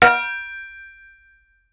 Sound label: Metal Ting